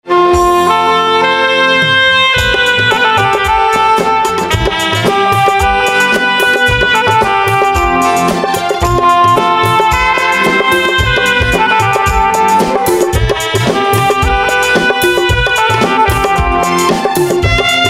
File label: Instrumental